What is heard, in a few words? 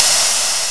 slip; JOSEPHIN